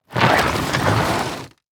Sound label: Impact Treasure Chest Drag On Dirt; Impacts